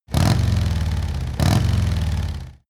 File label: motorcycle harley engine low rev multiple hard